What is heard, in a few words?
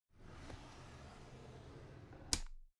drawer cabinet carton open soft